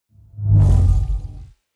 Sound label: sci-fi vehicle train drive by hum fast; raumschiff